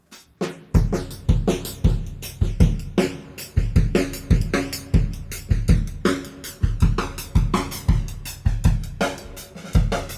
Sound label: flanging excerpt; MM-1000 drum test; Personal Music Sampler